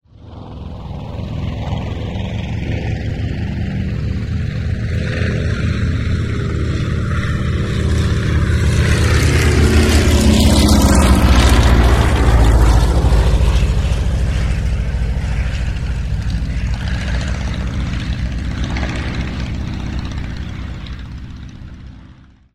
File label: SE5 Fokker DR1, aircraft sound record